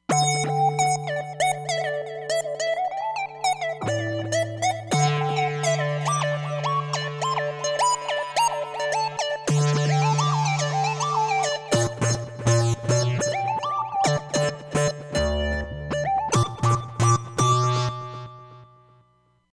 Square, Roland Fantom XR; Jupi Square

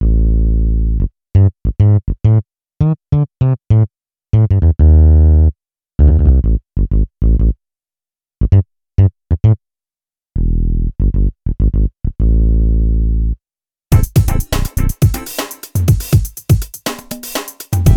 Bassman, Korg Radias-R

